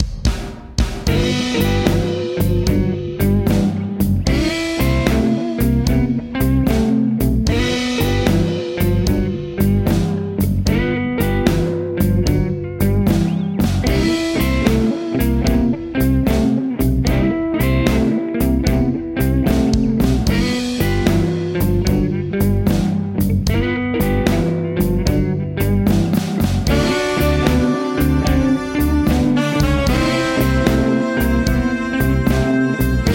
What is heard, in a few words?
blues; Playback 1 par Fchouille